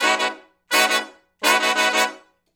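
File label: Funk Riff (B) harmony, Horncraft for R&B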